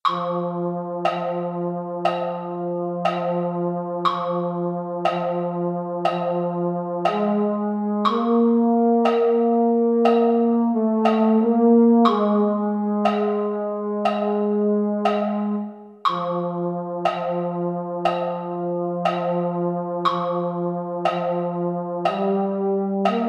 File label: baritone; concert corps